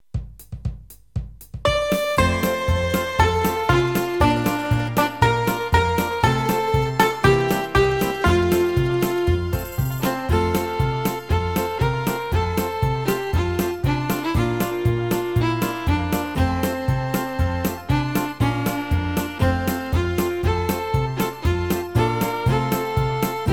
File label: bluegrass